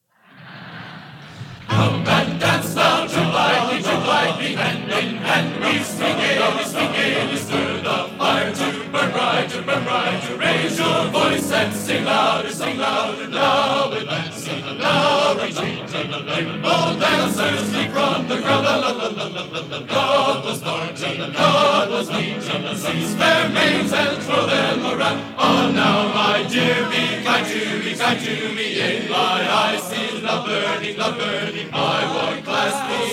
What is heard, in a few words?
Czech Dance Song, Recording of Czech Dance Song by the Purdue Varsity Glee C